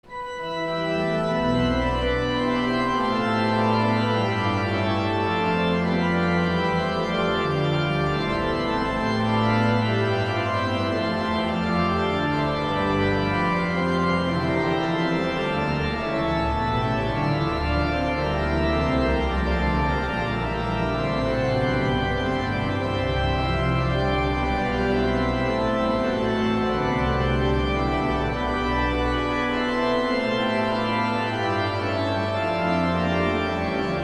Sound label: Organ, Chorale Prelude; Bach, Johann Sebastian; 'As Jesus Stood Beside The Cross'